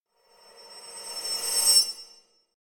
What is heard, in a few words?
Impact Metallic High Squeaky Impact - Reverse; Hollywood Edge; sound designer's toolkit v2